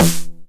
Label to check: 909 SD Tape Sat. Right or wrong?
Right